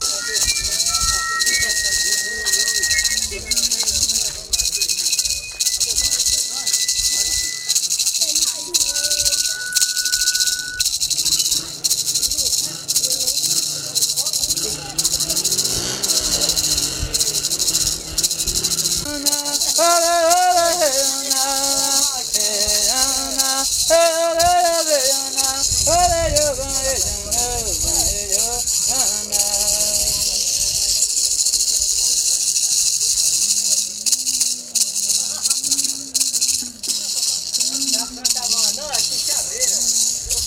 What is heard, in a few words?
pankararus